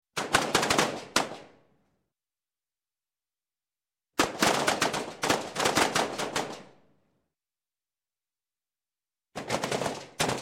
Gun, Hand Gun .38 Cal Police Service Revolver; Sound Ideas 2000 Series

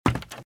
Footsteps - Wood - Creaky - Run - Left

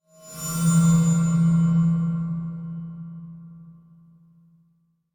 Cymbal Bowed Metallic Tone Low; Hollywood Edge; sound designer's toolkit v2

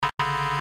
error; Code7

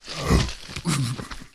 zombie attack

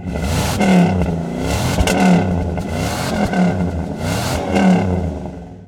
Truck Dodge Ram Revs; Trucks

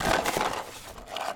Cardboard Popcorn Box Open; SFX Kit; Foley - Misc

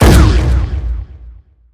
Gun Sniper Rifle Shot; Weapons

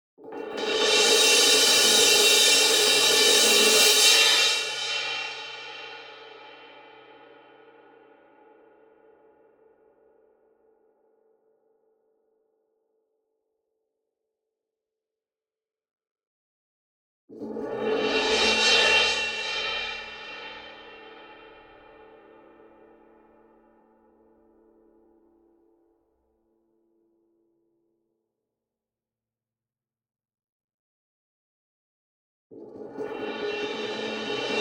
CYMBAL Crash Swell Soft Beater Near; boom library; Cinematic Strikes Construction Kit

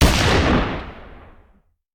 Hand Gun; Sound Ideas; Guns

